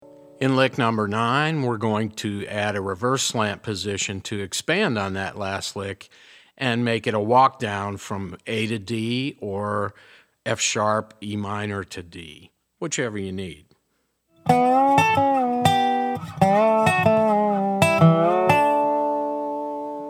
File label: resonator pedal, steel guitar